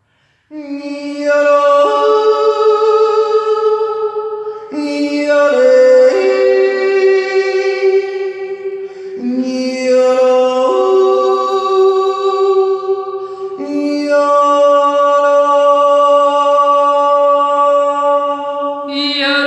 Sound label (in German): Seelenjodler; Kirche